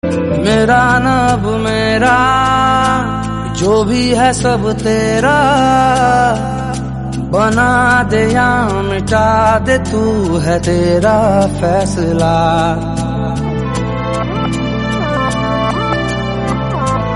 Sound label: Romantic Song Ringtone